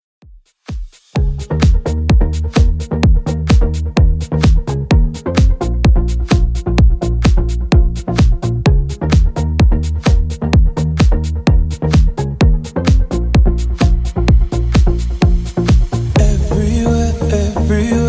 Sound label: 2ks Redrum 128 bpm